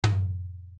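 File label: tom, Created on Garageband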